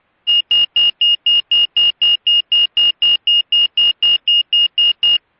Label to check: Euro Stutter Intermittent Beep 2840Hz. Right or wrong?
Right